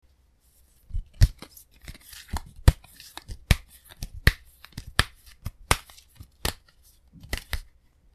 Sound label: hindu shuffle; japanese